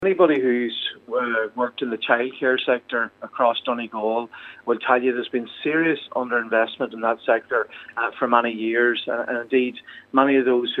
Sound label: pad